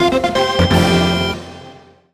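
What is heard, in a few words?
Fanfare goal; Kirby Star Allies; Nintendo Switch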